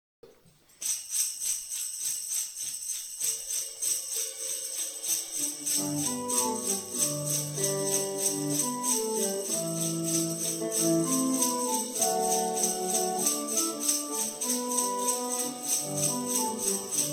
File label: Jingle